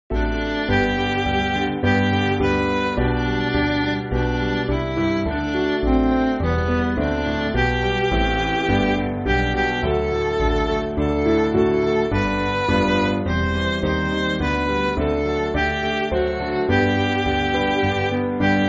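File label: Midi, Music Backing Files for Churches; My Jesus I Love Thee; Ellis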